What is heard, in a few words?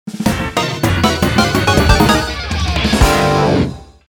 Battle Start Fanfare; mario kart tour original soundtrack